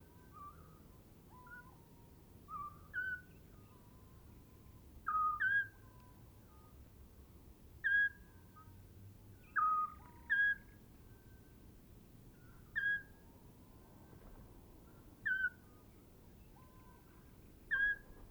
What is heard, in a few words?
Eurasian Scops Owl Hooting of female male then soliciting calls of female